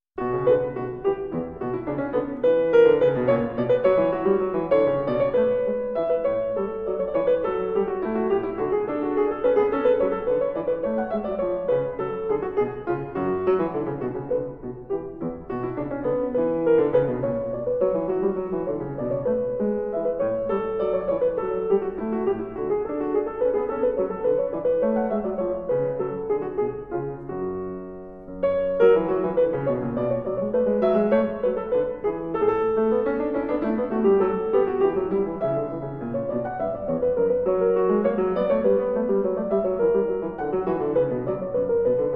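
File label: French Overture for keyboard in B minor, Bourrée; Johann Sebastian Bach; Gallery Of Classics- Bach; BWV 831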